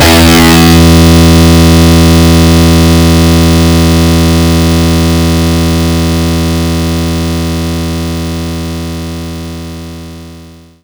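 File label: Distortion Guitar Low E2; knights try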